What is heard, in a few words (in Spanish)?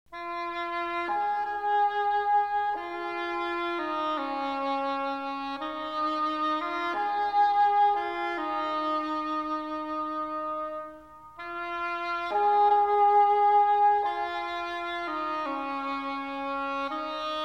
Sonido de corno ingles; Instrumentos de viento; Viento-madera; oboes